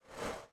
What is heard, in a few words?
Mech Footstep Texture Rewind